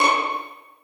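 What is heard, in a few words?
2nd violins pizzicato c#6; Sonatina Symphonic Orchestra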